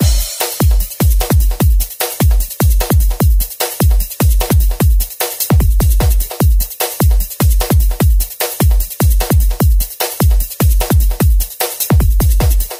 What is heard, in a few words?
drums loop